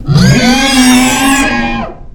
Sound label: boar shoot; Risk of Rain